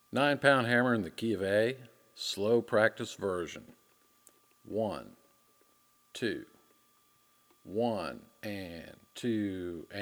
Nine Pound Hammer Key Of A Practice Version

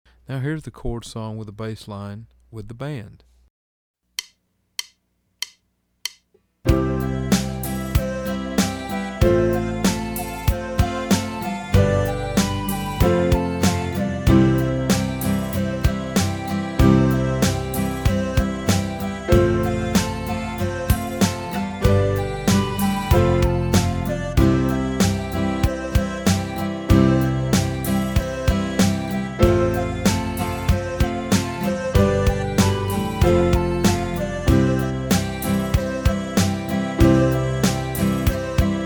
Bass Line Fast

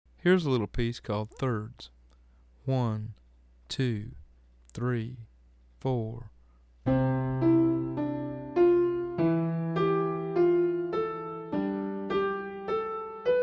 Thirds Slow

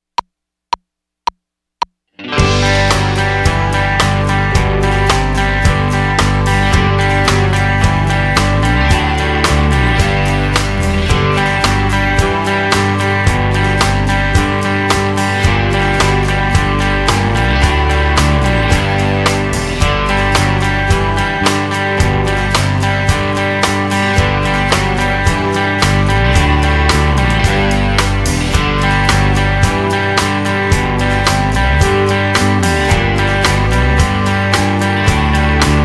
High Pedal In G

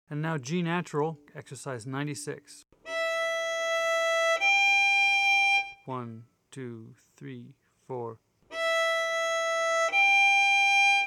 G Natural

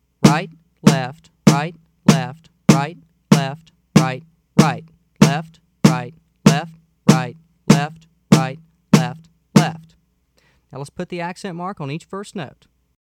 Rudiment Slow

